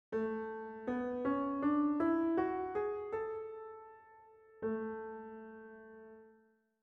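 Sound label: A major scale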